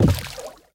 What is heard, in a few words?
Monkey Footsteps Water; BTD6; Legends